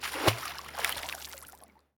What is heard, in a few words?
water splash